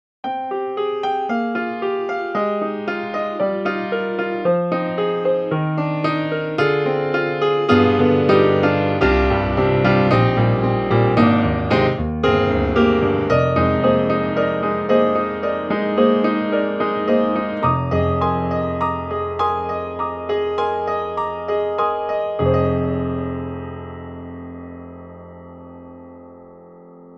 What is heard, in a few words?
The Big Grand Warm; VI ONE